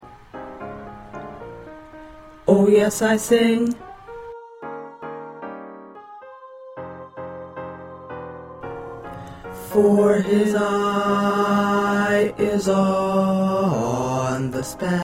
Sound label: Bass